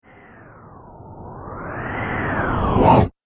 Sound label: Whoosh, Whoosh Sound Effects; sound design; Swallow This 1